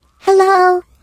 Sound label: turret stuck in tube